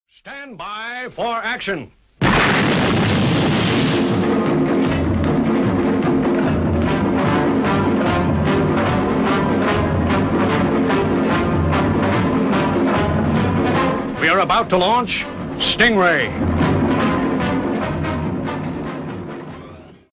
tv show intro

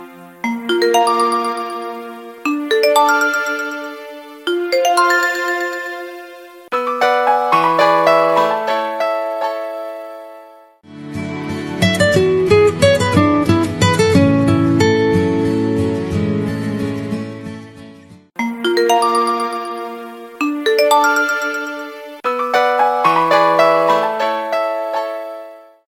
special tune

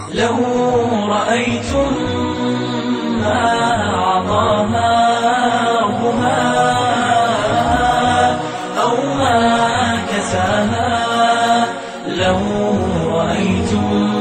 Arabic Nasheed

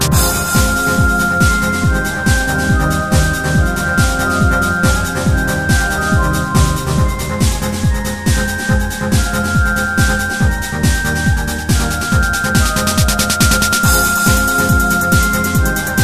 Chimes

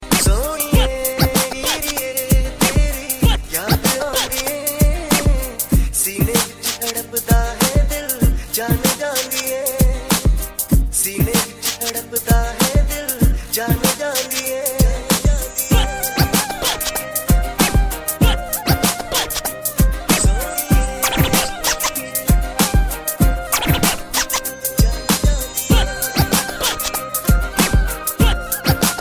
hiphop

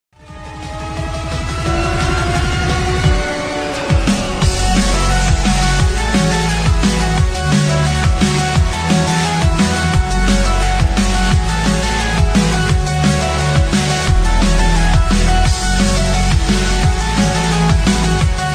Cool wakeup Ringtone

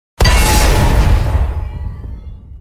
Falling Sword Impact

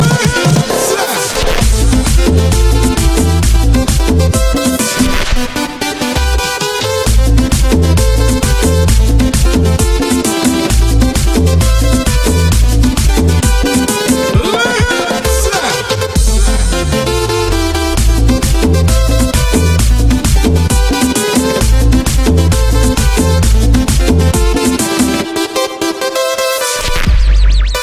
tribal mix

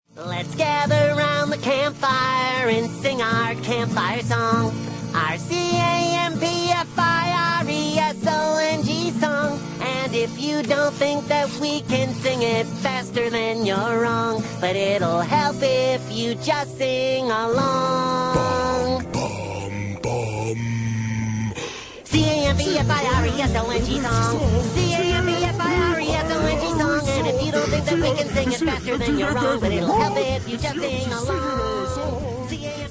campfire song

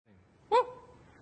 whoooooo

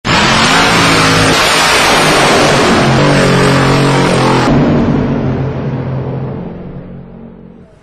dragster

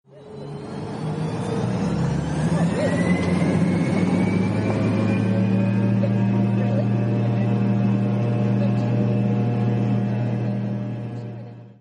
Turbinnn

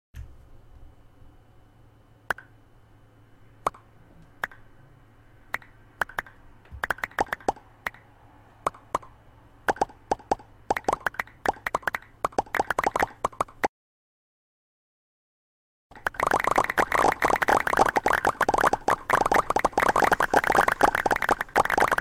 pops; Minecraft

